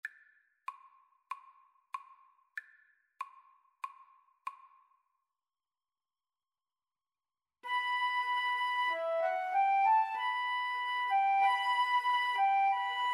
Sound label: Flute duet